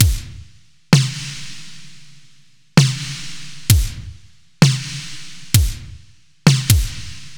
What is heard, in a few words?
Beat Basics; Zero-G; Future Wave; Beats